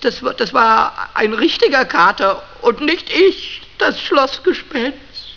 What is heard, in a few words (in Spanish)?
clarin